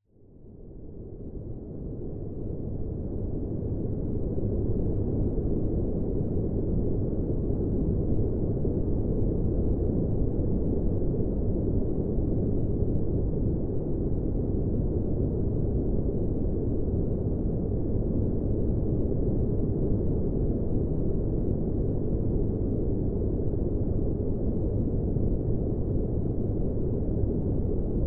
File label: pink noise BB4Hz Mix